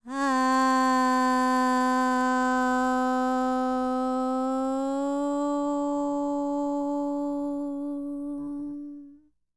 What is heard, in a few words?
World Voices, INDIA; ILIO; Vocal Planet